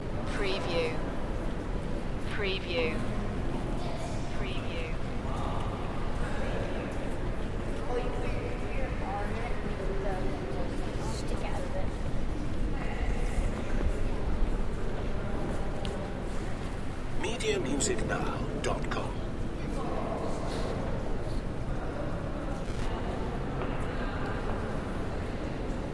Museum Ambience, New York Metropolitan, Distant Crowd, Roomy